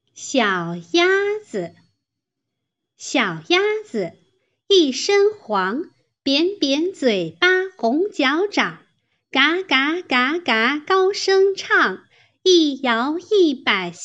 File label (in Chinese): 童谣